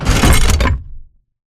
Metal Jog; Titanfall 2

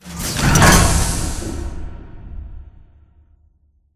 Robot Arm Track Speed Change Close; Boomtown; Titanfall 2

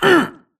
grunt hits; Titanfall 2

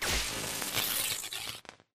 arc titan sizzle; Titanfall 2

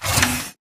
Mvmt Servo Light Short; AI Stalker; Titanfall 2

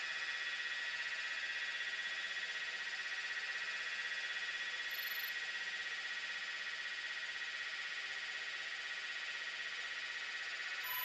Int Control Room Desk; Blackwater; Titanfall 2

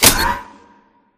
Fence Flip Start; Titanfall 2; Boomtown

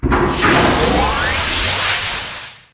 generator shieldrecharge start; coop; Titanfall 2

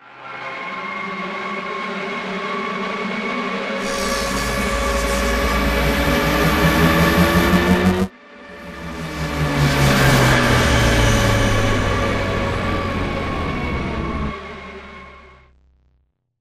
tower minor pulse build; boneyard; Titanfall 2

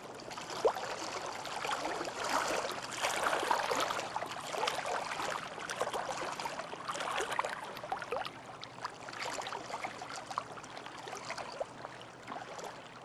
amb dock water; angel city; Titanfall 2

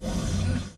atlas leg servo; Titanfall 2

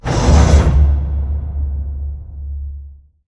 pod door lg verb close slide; Titanfall 2

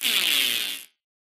bullet energy titan shield spang cluster

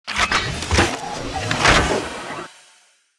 mortar knee reload; Titanfall 2

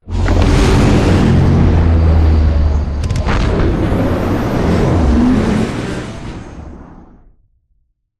strafe close; Beacon; Titanfall 2

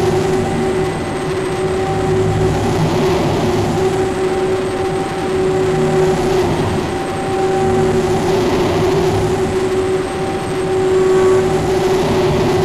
blue turbine loop mid; Titanfall 2

